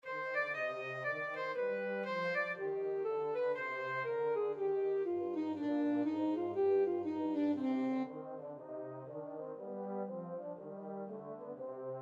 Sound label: bach bouree, flexible mixed ensemble 3 players